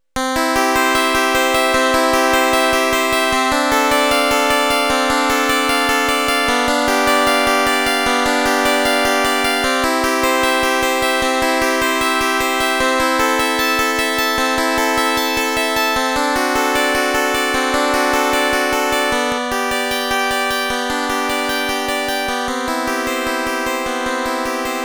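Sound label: bach clavecin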